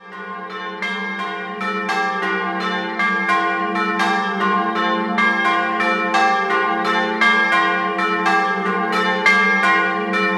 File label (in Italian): campane; ARIIS